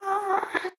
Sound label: moan, ghast; mob